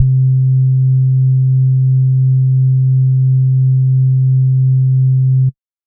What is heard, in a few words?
SBASS C2, Sub Bass Sine; neuro; Stanza